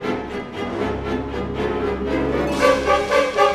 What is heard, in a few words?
Orchestral Loops